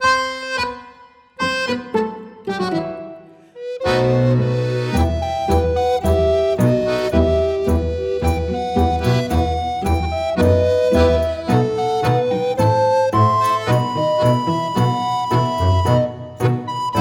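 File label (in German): Tango flautissimo, Flötentöne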